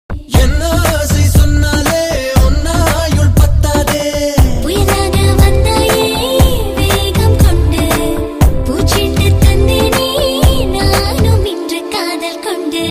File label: romantic